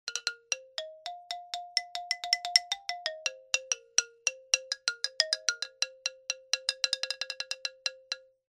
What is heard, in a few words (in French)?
Son Xylophone; Duperrex